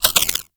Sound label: Freeze Ray Mech Chamber; Warframe; Corpus